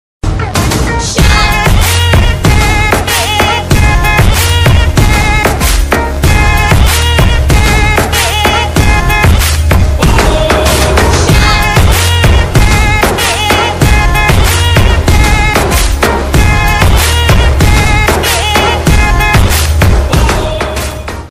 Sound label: BGM ringtone